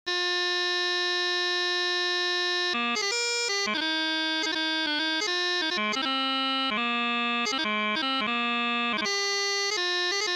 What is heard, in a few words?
The Lilt Chanter